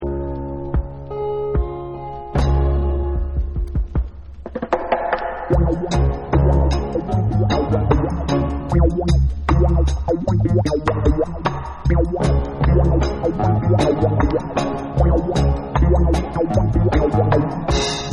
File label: Dub